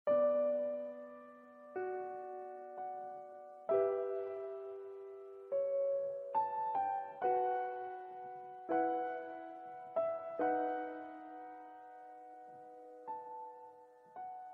Gameover; Heroes Trials

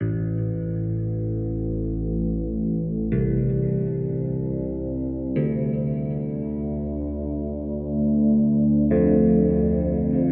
guitar; void bastards macos ps4 switch windows xbox one gamerip 2019; Krell

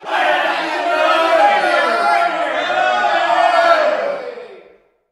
Crowd Angry; Prison Architect

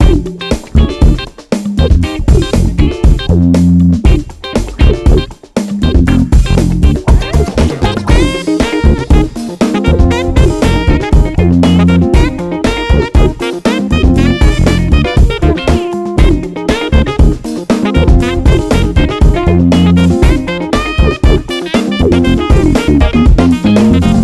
Funk Jam (short) - Edit Menu; pro evolution soccer 6; ps2

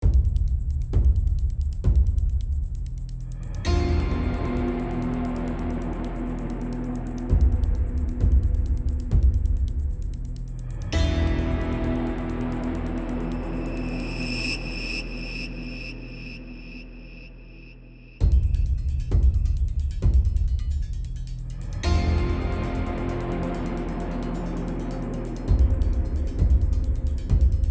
tempo 132.0; assassins creed odyssey the fate of atlantis episode 1 fields of elysium gamerip 2019

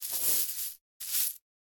Heist SFX; Prison Architect

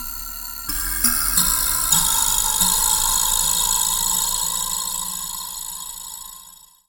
Lo Vibraslap

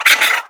mole hit; birthday party bash; wii; Spanish; water balloon

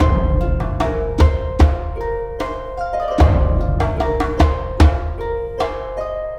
transitionary loop

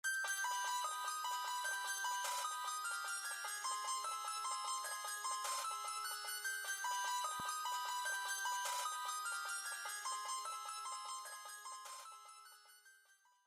E-Mail; paper mario the thousand year door; from Mysterious X

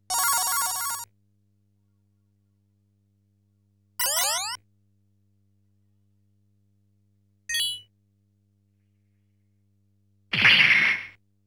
Item - Extend SE; Great Magic Strategy; Mahou Daisakusen; Sorcerer Striker

